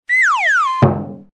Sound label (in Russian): zvuk tupogo udara pri padenii so svistom